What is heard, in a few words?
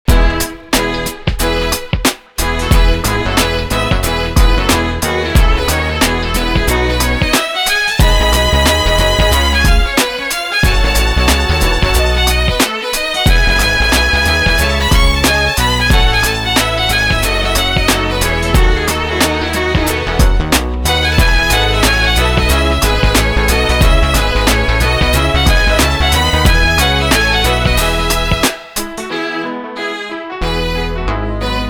violin concerto in a minor 3rd movement; Bach's Violin Concerto No.1; BWV 1041